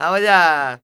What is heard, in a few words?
Flamenco, Vocals, Male